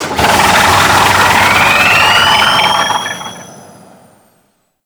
Archwing Demolition Reverse; Warframe; Vehicles

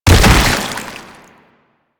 Bodyfall Light; Warframe; Proto Infested

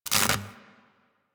Worker Welder Stop; Grn Worker Welder

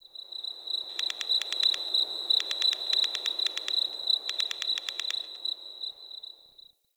Night Tree Critters

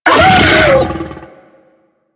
Infested Juggernaut Vocal Pain; Warframe

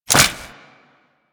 Latch, Foley; Warframe; Grineer Royal Jester

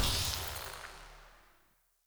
Energy Blade Projectile; Weapons; Player; Swords; Powers

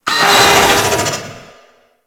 Shipyards Robot Malfunction; Warframe; Grineer Shipyards

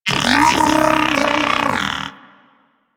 Moa Pet Tenacious Battle End; Warframe; Pets

